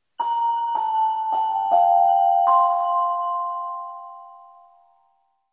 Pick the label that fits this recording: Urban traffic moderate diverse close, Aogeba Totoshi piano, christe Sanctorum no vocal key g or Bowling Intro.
Bowling Intro